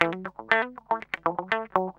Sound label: GUI, LICKS; 120 LICKS